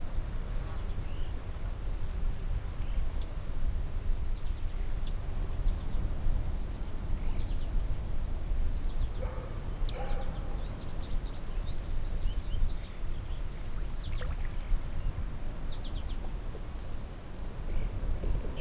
Seaside, Birds, Surf, Calm, Dist; Poetry Project

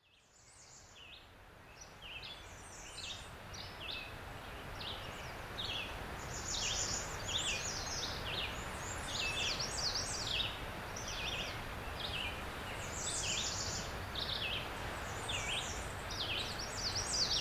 AMBIENCE WOODS; CANA ISLAND; Interactive Lighthouses

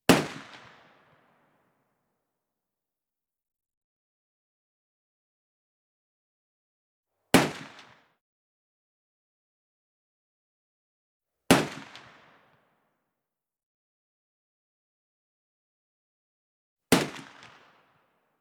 Gun Musket Single Shot; Electa Quinney

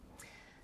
Birds

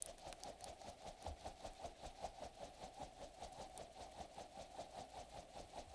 Rope, Lassoo, Twirl, Fast, Airy; Universal SFX

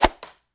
Whoosh, Arrow, Feather, Snap; Universal SFX

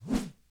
Whoosh, Low, Smooth

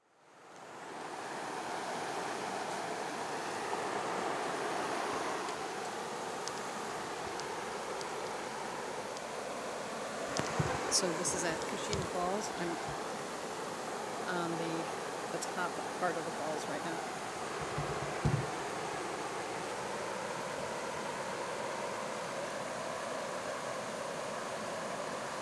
Keshena Falls From Top; Menominee PowWow 4 Aug 2023; Menominee Homecoming Powwow August 2023

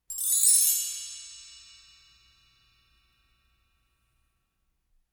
Bell Tree Accent Fast Up; Electa Quinney

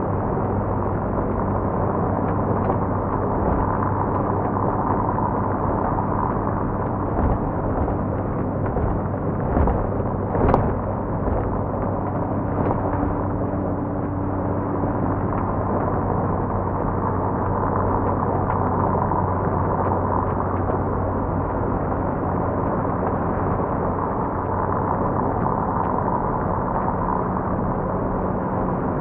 Car Road Noise; Poetry Project

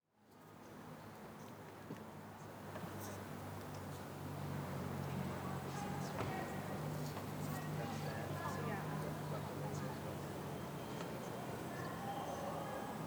powwow quiet; Menominee PowWow 4 Aug 2023; Menominee Homecoming Powwow August 2023; Bowl

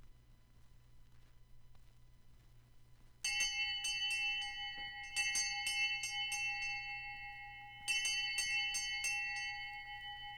Hand Bell; Electa Quinney

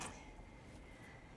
Birds

